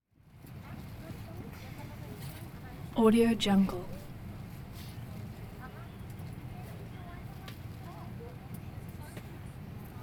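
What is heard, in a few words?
airplane interior airbus 319; mexico city bound; prosoundfx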